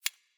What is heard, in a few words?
Map Marker